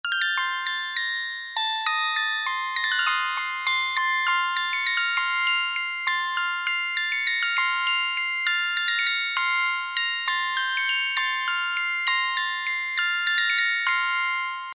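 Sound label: musical box; shkatulka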